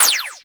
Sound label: Fire Shot; Burston Prime; Warframe; Weapons